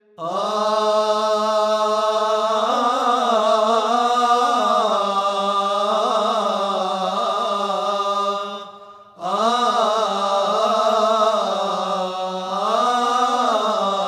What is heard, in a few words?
First Amen Confession